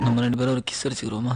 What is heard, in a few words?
Kiss; Aadukalam